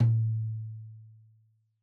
Tom2 Stereo, Deeply Sampled Drums

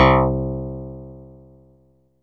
clv c2, EMU E-III Clavinet Digi; Samples vol.55 - Retro Sampler

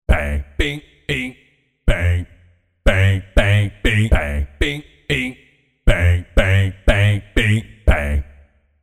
Jazz Bass Multisamples; ILIO - Vocal Planet VOL-6; Banks & Binks demo

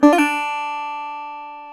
GTR, Dobros, National

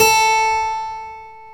KEY HRPCR; Roland - LA Composer vol. 1

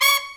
SECT BRASS FX

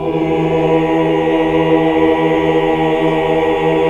AAH, AAH OCT; Best Service; Hallelujah

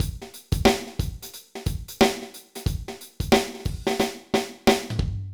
Ambience Loop, BPM 90

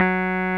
Roxichord, E.Piano

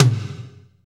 TOM, Drumscapes Roland

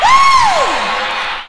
vox special; Ueberschall; Techno Trance Essentials

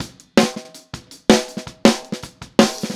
Snare, 162 BPM; Verse; Drums

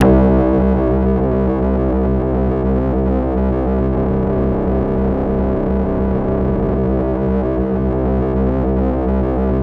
analog, special fx twisted; USB Soundscan; AKAI

